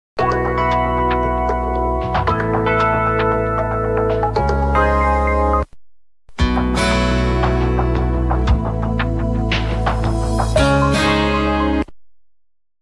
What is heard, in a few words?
jingle pub; rtl7